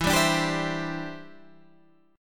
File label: E Minor 7th Sharp 5th, down, Guitar, Open G